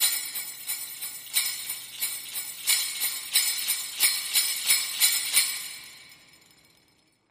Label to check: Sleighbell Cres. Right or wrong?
Right